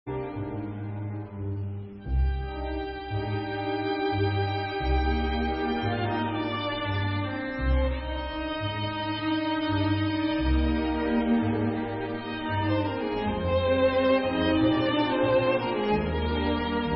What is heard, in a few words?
Oblivion